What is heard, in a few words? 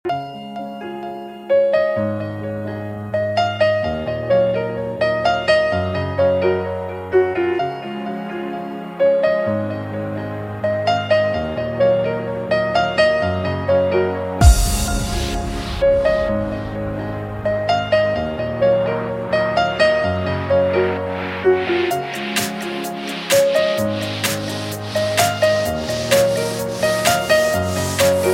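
romantichnij rington, romantic ringtone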